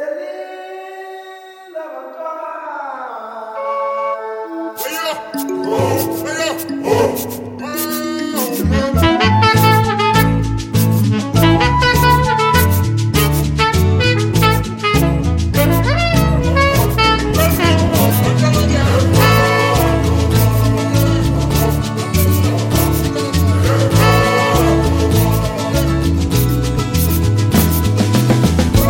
IPhone 12 Ringtone; Stock

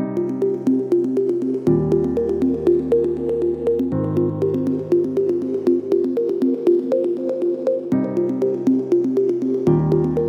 Samsung Galaxy M12 Samsung Ringtones; (India)

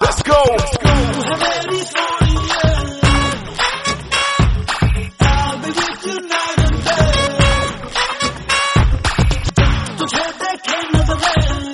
Bollywood Best Trendy Old Ringtone

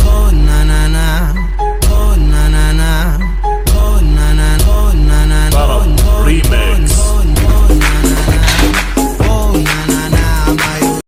Electronic Ringtone

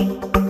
Samsung Galaxy Tab A8.4 Notification Ringtone

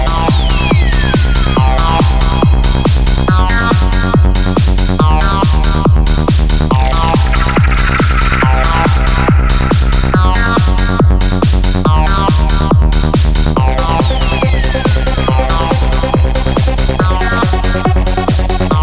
Nokia Ringtone; Nokia 8.3 5G New Official Ringtone

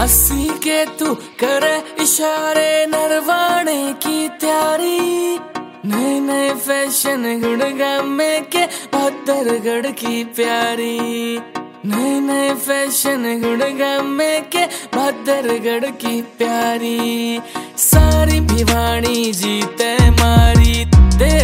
Ringtone, Punjabi

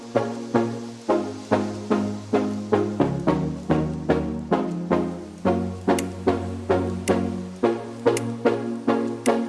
Notification Ringtone; Vivo Y77e (t1)

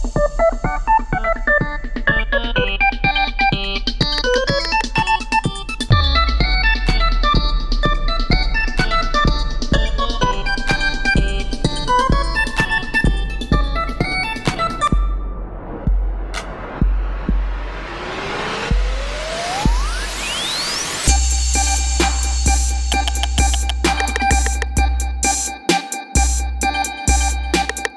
Nokia 2.2 Ringtone; Best 2019 Nokia 2.2

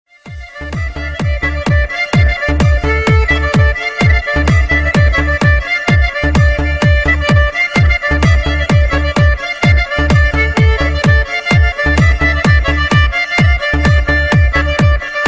French Ringtone; French (1)

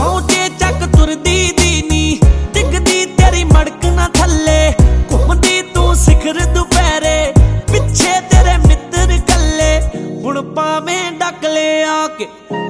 Ringtone, Punjabi

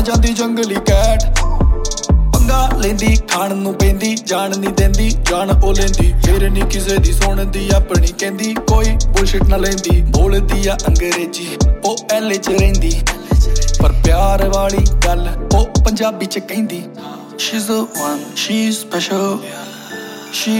Ringtone, Punjabi

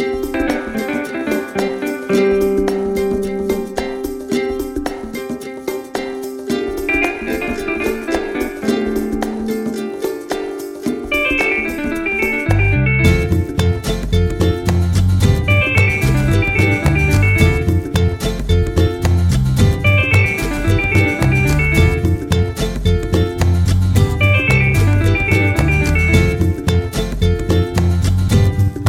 90's Cartoon Ringtone

